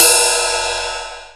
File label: ride, drumtraks